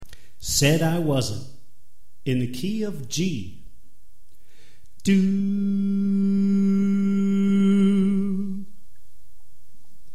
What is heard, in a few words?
Tenor Left